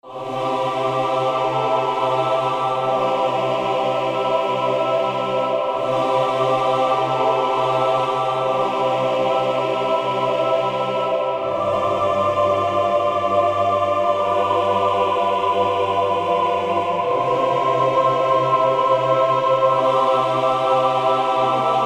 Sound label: choir double; kiyosi konoyoru